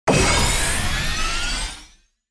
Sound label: cooking game power up dish grow; esmteam